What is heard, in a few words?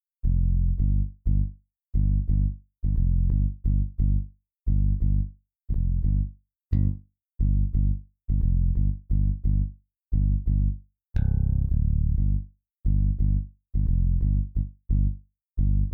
hey bass